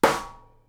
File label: SNARE, Live Snares